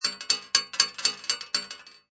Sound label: Multiple bullet shells drop: Machine gun multiple metal; Machine gun multiple empty shells fall on metal